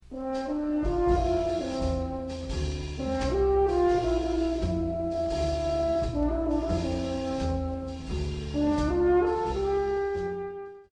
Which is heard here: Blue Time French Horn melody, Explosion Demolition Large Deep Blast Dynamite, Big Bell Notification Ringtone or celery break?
Blue Time French Horn melody